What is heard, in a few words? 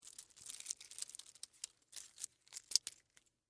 Wrist Watch On, Foley, Wrist, Watch, Put, On; Clock; Machines; Clocks; BlastWave